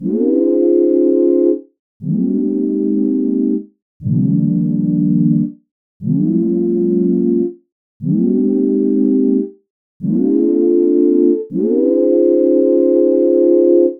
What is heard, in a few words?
glide example